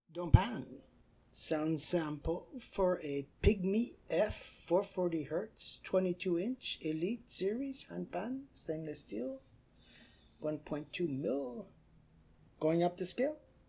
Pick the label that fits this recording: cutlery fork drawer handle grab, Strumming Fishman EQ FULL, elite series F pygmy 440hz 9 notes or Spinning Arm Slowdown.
elite series F pygmy 440hz 9 notes